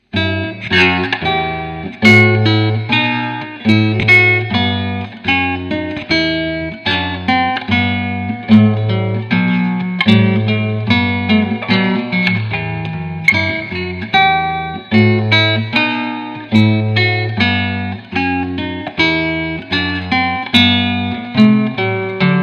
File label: Bourree